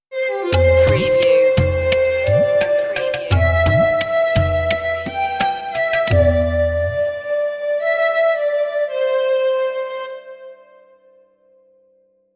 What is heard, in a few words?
indian lullaby